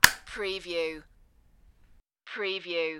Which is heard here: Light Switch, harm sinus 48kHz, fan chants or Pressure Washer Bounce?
Light Switch